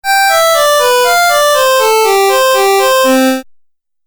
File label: Game Music Phrase, Video Game Sound Effects